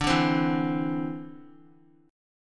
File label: D 7th Suspended 2nd down Guitar; D7sus2 strummed